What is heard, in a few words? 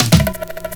DRUM FILL; DRM FILL KIT; AKAI